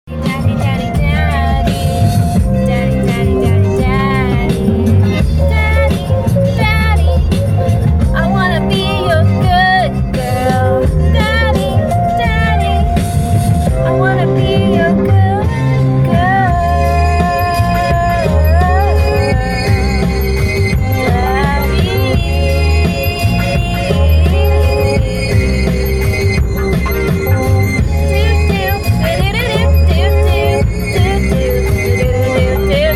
Bad singing

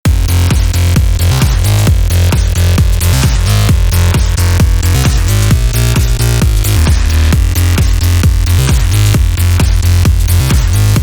Massive bass

